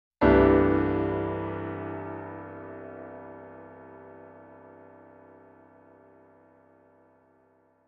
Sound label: G Grand Acoustic, piano